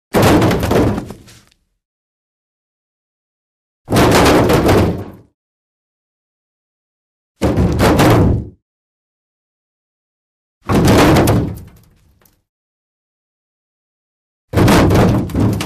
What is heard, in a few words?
Body Falls Onto Car Hood Multiple Heavy Body Impacts; Hollywood Edge Foley Sound Library